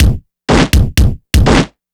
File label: Processed Beat, Drums & Percussion